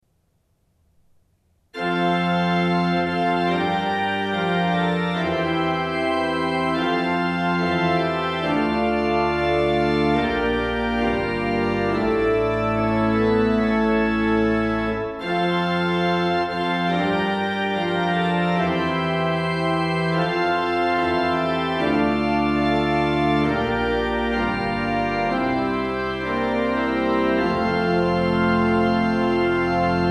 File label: Fanfare; Min egen fanfare. Orgelet mangler litt i dybden. Så den ble ikke helt slikt jeg liker den.; Jemlich Orgelbau Førde Kyrkje; ZOOM H4n